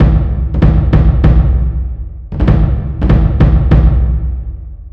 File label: loop